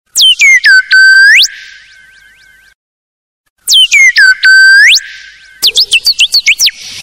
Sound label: New High Pitch Msg